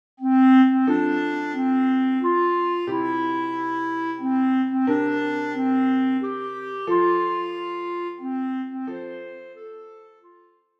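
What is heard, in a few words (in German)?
Bassklarinette; Happy birthday to you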